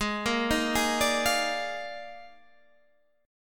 D sharp Major 7th Suspended 2nd Suspended 4th, Guitar; Gsharp; 16,13,13,13,15,13; chordshape